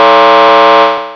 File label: buzzer